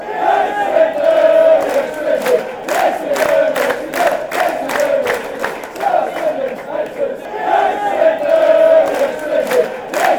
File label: we hate swindon oxford, fan chants